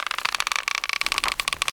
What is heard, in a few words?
Node Lever Drag Loop